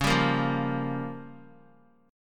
C sharp 6th Flat 5th C sharp down; strummed; C#6b5; JGuitar

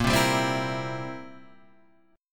C 7th A sharp

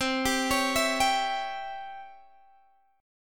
C sharp Minor Major 7th Flat 5th C, guitar chord

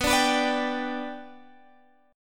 B Minor Sharp 5th, Guitar, Open G

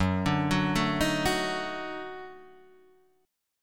Eb Minor Major 7th Flat 5th Gb, chord

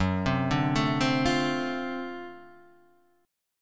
D 7th Sharp 9th Gb

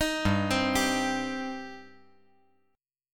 G Suspended 4th Sharp 5th G, down

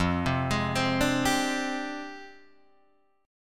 F Minor 6th Add 9th F down Guitar Open D

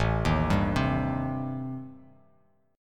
A-Minor 7th Flat 5th, Bass Standard, chord; arpeggiated; JGuitar

